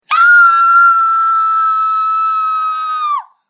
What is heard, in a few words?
Scream Human Female; Screaming Sound Effects